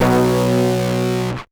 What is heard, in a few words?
guitar stab